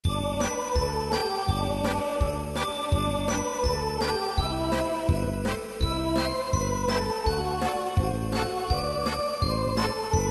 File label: town jingle bells